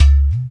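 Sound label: percussion udu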